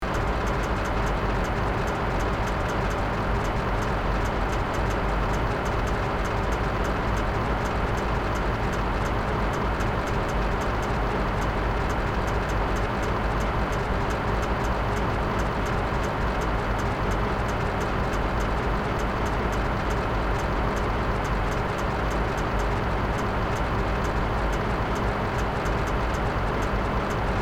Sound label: Truck engine moving truck with quirky noise loopable